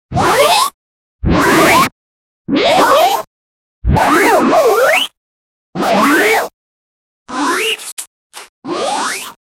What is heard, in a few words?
take off swoosh fast